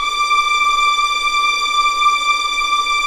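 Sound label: D6 legato piano, violins; East West; The Ultimate String Collection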